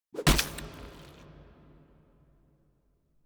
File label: ambience room torture gulliotine cut